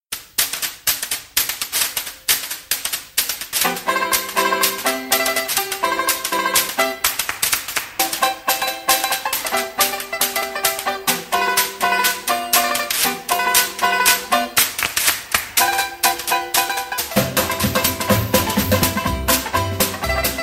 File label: Banjo Band